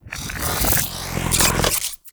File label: Aoe Shockwave Charge Up; Powers